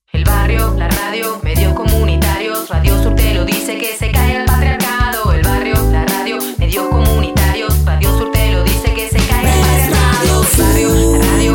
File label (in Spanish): jingle feminista; Radio Sur